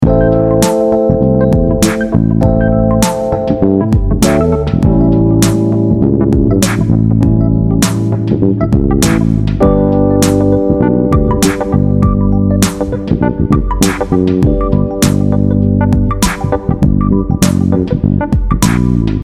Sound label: 221 G major, Ami7–A7–D7–G; adding an A7 — the secondary dominant of the D7; bass; chord substitutions